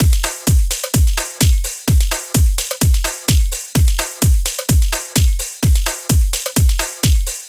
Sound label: Shuffle Full Drum Loop - 128 BPM; Drums; Loops; House; Cymatics; House Starter Pack